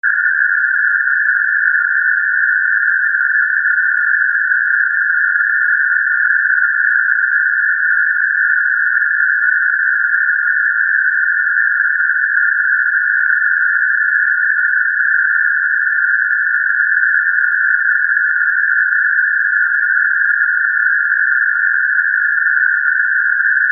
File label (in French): bruit rose